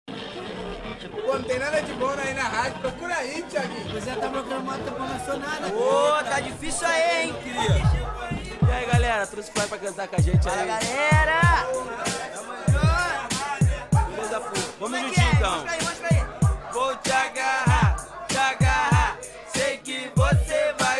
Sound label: funk